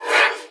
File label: sapper snap; огрызается 3; Насмешки снайпера